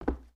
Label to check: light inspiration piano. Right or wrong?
Wrong